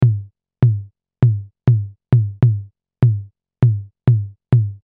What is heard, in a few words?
Diwali Tom